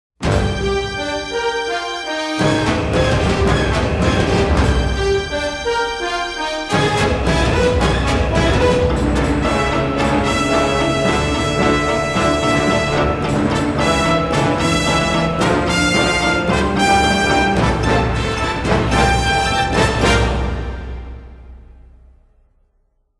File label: Klingon Mission Start (With Choir); Ron Jones Collection; Star Trek Starfleet Command; windows